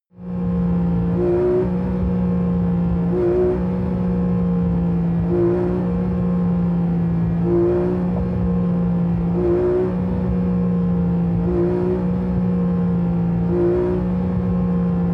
sports car int engine drive short thrusts increasing rpm high; verkehr transport; autos